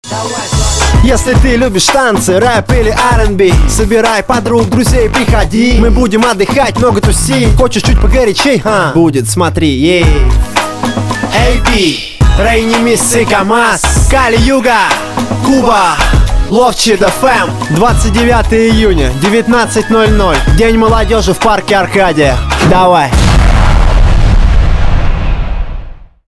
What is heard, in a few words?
radio jingle